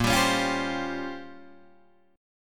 A sharp Minor 6th Add 9th A sharp down Guitar DADGAD, chord